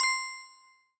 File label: claim achievement; Skillzgaming; fruit Blast 92 Desktop